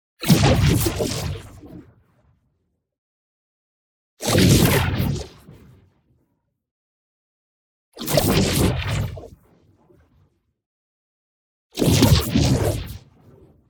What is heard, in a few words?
ABILITY RELEASE Electric Caster; boom library; Matter